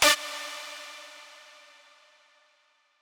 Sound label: lead